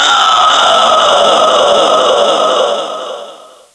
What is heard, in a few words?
Siren Death; Carol